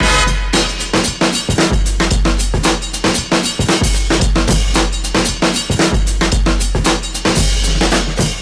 Drums, breakbeats; Head Spins; Ableton; Breakbeats by KutMasta Kurt

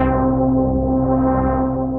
Live Pad; Synth Pad; 101 Live Pad; Ableton; Factory Packs; Synth Essentials

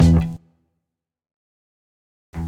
Guit; Ableton; Breakbeats by KutMasta Kurt

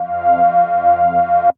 vinyl stringz, synth pad; Ableton; Beat Tools

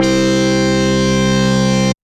Synth Lead

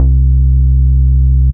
Sub Dirt Bass

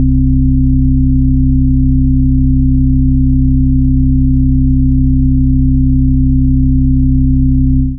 Octaves C0-C3; Notes and Clusters

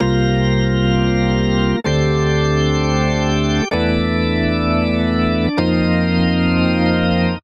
Progression Breakitup Organ 7sus C Major 129 bpm; Electric Keyboards; Ableton; Factory Packs

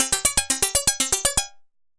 Synth Rhythmic; Shake & Bake; Ableton; Glitch and Wash

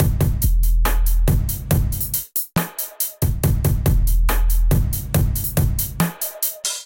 Kit groove 140 bpm, Drums; MIDI Clips; Canvas; Ableton; Factory Packs; Chop and Swing

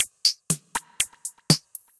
Kit-707, effected drum kits; Drum Machines; Ableton; Factory Packs

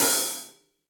Hihat Open Low Loud Squashed; Drum Hits; Ableton; Factory Packs; Session Drums Club; MPE Hits

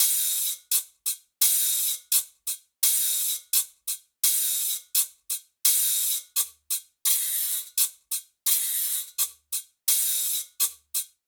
Guajira Guiro 85bpm, Latin Percussion; Ableton; Factory Packs

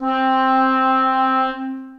Woodwinds Ensemble, Orchestral Woodwinds; Ableton

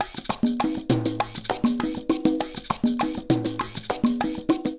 Charanga 200bpm, Latin Percussion; Ableton; Factory Packs

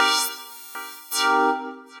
Light Chord, Synth Keys

